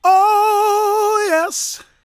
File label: GOSPEL MALE; Spectrasonics Vocal Planet